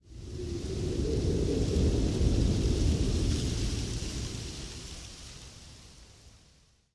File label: wind, outdoor ambient